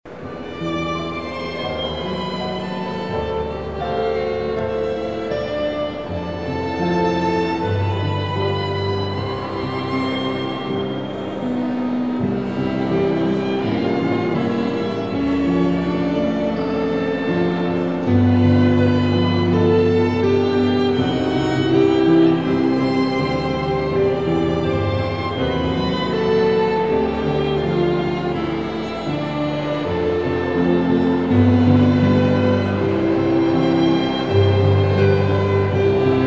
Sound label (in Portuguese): Piano e Cordas